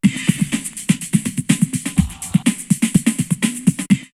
drumloops Rave 124; X-Static Goldmine; 90 s Sample CDs

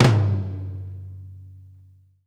FLAM RACK, TOMS; Sampleheads; New York City Drumworks VOL-1; SP TOMS

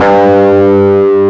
G1, GUITAR; AKAI S-Series CD-ROM Sound Library VOL-8

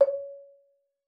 marimba C#5; Xsample VOL-4

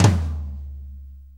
Blues Tom Flam; Sonic Foundry (Sony Creative Software); Drum Tools; Flams

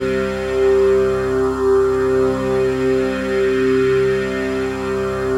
PHASE PAD; Best Service; XX Large Pads; Sample CDs

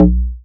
BASS Heroic, bass; M8; Electronisounds

